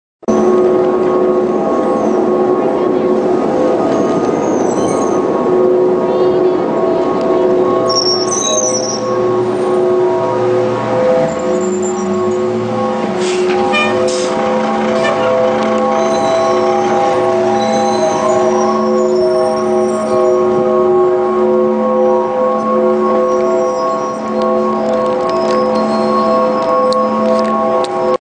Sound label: grate